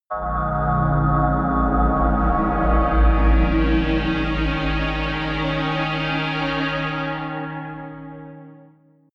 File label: large hollow drone